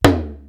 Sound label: DONDO, WORLD PRCS; East West; Global Sounds